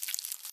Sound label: step, silverfish; mob; Minecraft